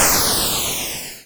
atari boom

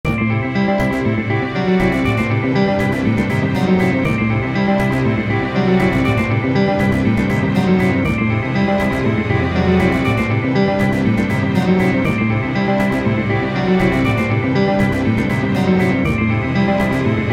Charming Waters

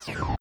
laser

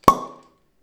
cork

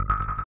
turret

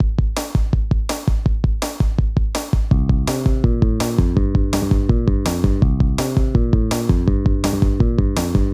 Arcade fast flow Intro

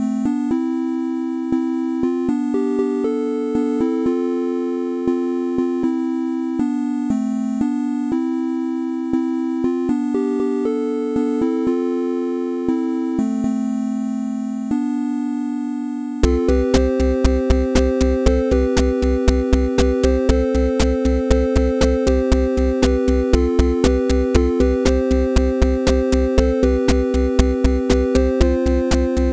The Organ NES

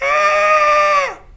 Cat

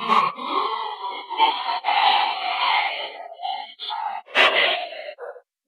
radio death

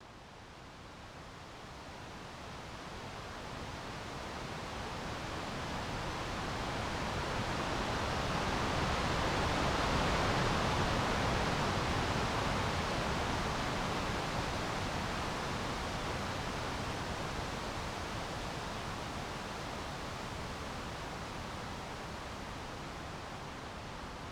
Rainy

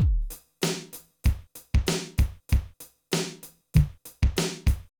drums

